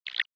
approach pulses audible

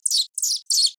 aggressive trills audible

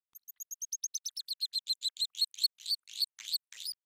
territorial song audible

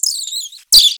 courtship trills audible

